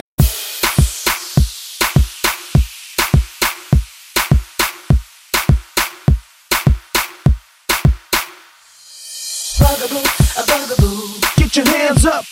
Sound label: 90s Moombahton